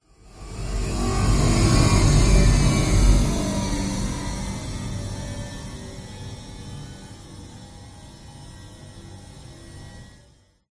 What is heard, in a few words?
future plane landing doppler animation particle swish swoosh accent logo sci fi; futuristic ufo; epic stock media